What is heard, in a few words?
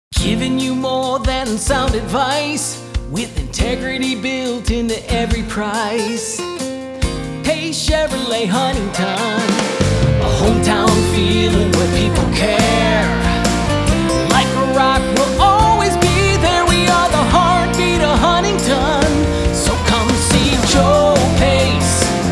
Jingle; Pace